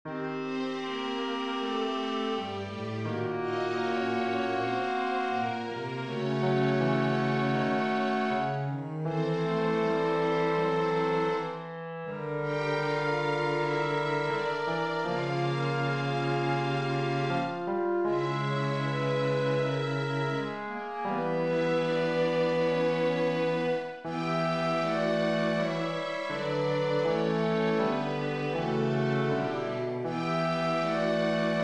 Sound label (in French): melodie